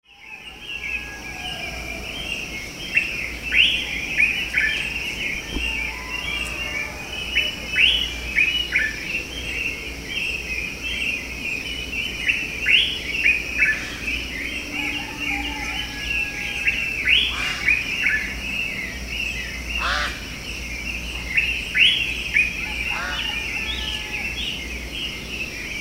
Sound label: Ghana morning